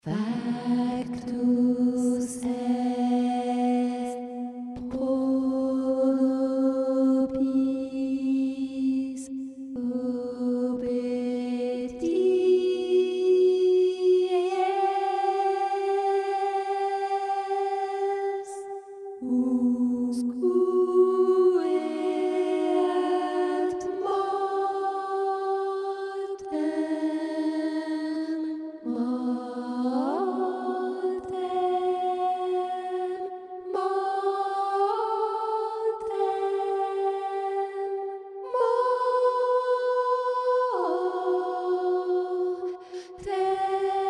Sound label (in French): passionne christus alto